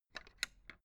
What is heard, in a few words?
fence gate latch rattle open; objekte; tueren